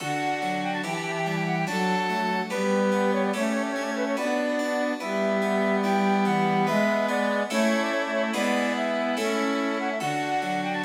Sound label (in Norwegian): Menuet for fløyte, fiolin, cello og cembalo